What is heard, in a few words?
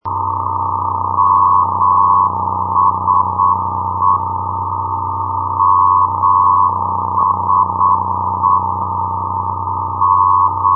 ndb muenchen mse 358.0; europe